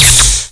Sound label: flak cannon bullet hit